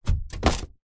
polystyrene thin sheet set down on concrete; foley; zapsplat

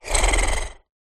animals horse snort human; zapsplat

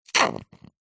hospital syringe suck in foamy liquid short fast

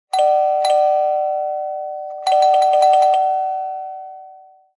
household doorbell ring fast several impatient

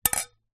household plastic wine glasses bang together

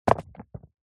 sport soccer boots drop on hard ground; zapsplat

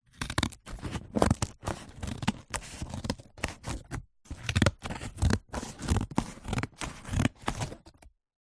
office scissors cut cardboard

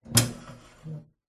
household ironing board built in fold

